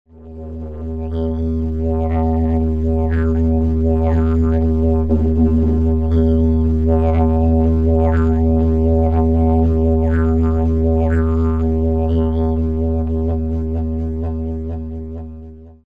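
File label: plastic, didgeridoo, PE-Rohr aus dem Baumarkt; Plastik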